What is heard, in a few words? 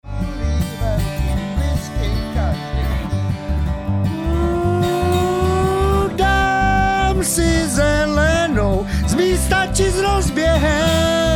vocLead, bluegrass